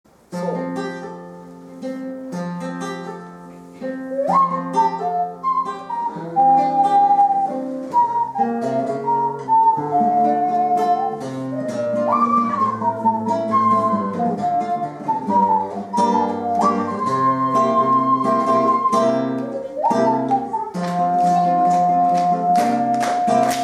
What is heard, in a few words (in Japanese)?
オカリナ; イタリアで生まれたオカリナを演奏しました。; この丸い感じの笛はやはり素朴さで人を惹きつけます。; 小学校・音楽鑑賞コンサート; 地図を西にたどり黒海からイタリア半島へ。; 貝塚市葛城小学校